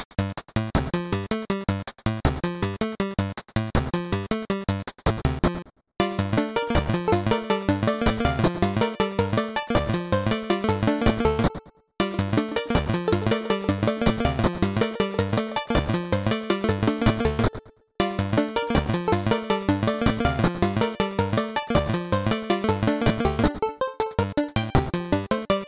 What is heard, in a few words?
alt stage, platformer chiptunes